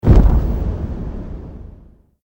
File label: rollover; casa dos gritos